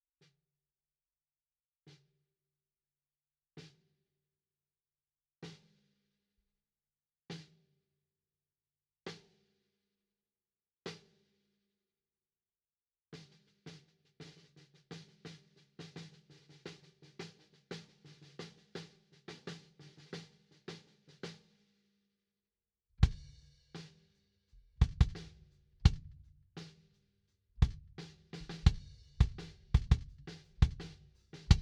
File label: Bass Drum internal low, 13x6" Ahorn